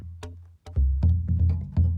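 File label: Calm Far, music; From Dust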